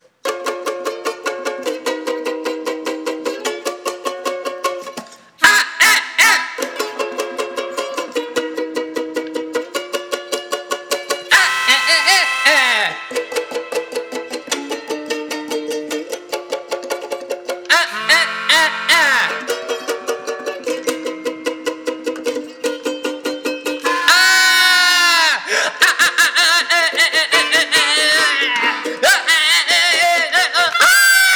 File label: solo jazz